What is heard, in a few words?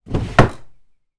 drawer shut hard; household; zapsplat